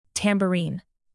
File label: tambourine